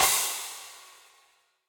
Crash Ambient Loud Punch, Cymbal; Drums; Drum Hits; Standard Hits; Session Drums Studio

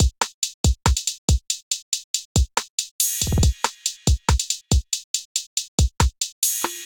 Kit, Trap Drums; Enigma Kit; Ableton; Factory Packs; Trap Drums by Sound Oracle

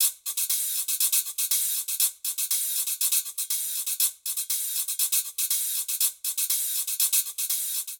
Merengue Guiro 120bpm; Latin Percussion; Ableton; Factory Packs

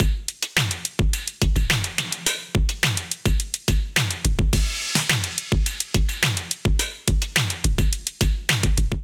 Kit 106 Bpm, Drums; Cartography

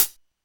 hat; Drums; Ableton Foundation FreePacks

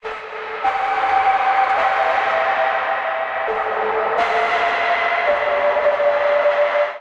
Buried A Minor 137 bpm, Pads; MIDI Clips; Tonal; Truely; Skitter and Step; Ableton Factory Packs

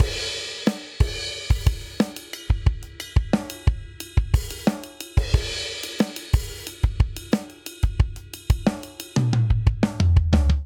Stadium Rock Chorus 90 bpm; 4 4; MIDI Clips; Ableton; Factory Packs; Drum Booth

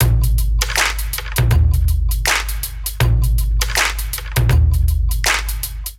Sun Wash Kit 80 bpm, Drums; MIDI Clips; Drive and Glow; Ableton; Factory Packs

